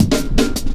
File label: metallic stepz cut, drum loops; Zero-G Jungle Warfare 1, 2 & 3